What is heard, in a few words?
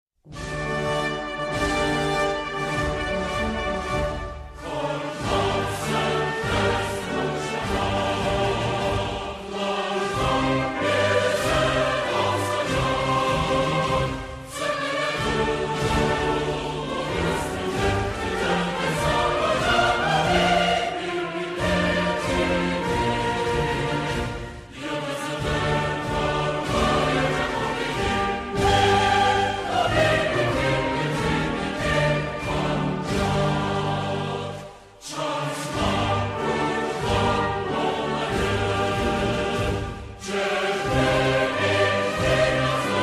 Sorod Türkiye, سرود ملی ترکیه